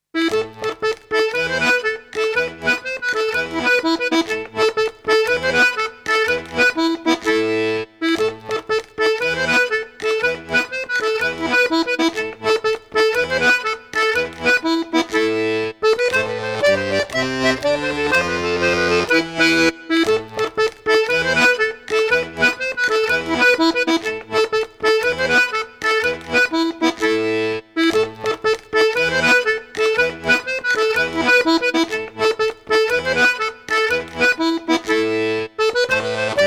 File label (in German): akkordeon